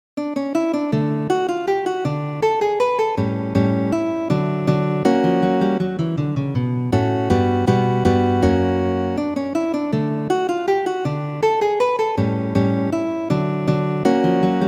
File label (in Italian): valses, guitar